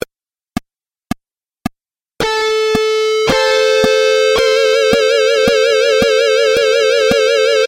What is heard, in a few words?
Layered Harmony Example